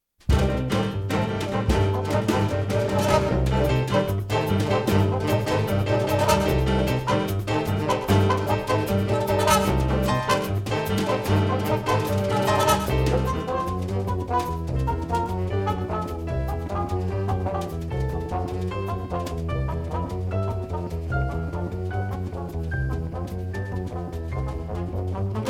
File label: gt stomp